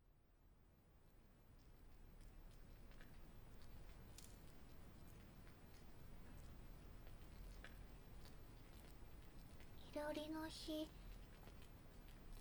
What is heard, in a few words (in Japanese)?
耳なめと手で